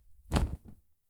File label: Shakes T Shirt